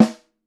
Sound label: puffy snare sound A key; Duration 0:01; Samplerate 44100 Hz; snare drum samples